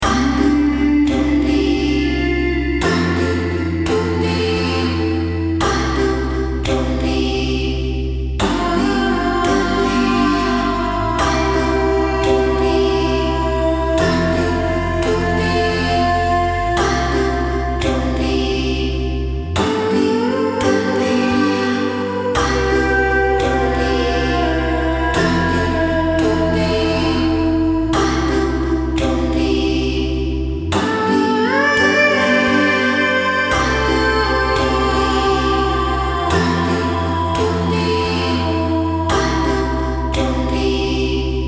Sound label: Healing Melody